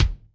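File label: Kick Tite Head, Alesis D4; Kik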